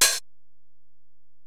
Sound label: HiHat, Alesis DM-PRO